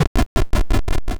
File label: steps; spelunky 1 1